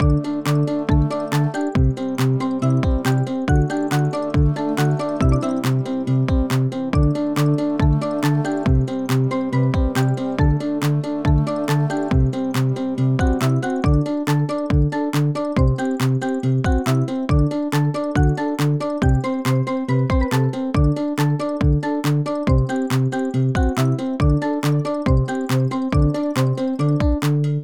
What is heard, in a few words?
Fast BGM